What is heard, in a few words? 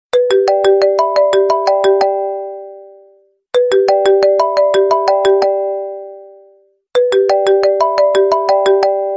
apple message tones